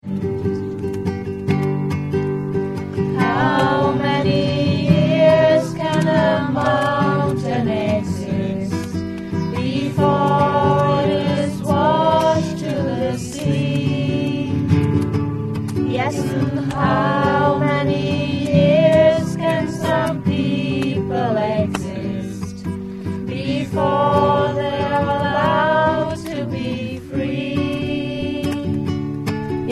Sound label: blowing; schweden 2000